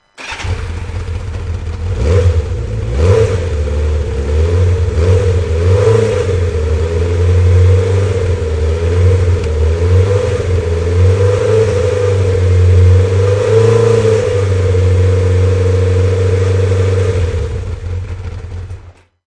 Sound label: Laser